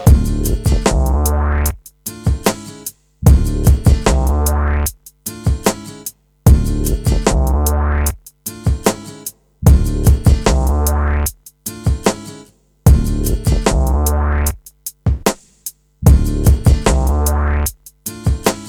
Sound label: instrumental remix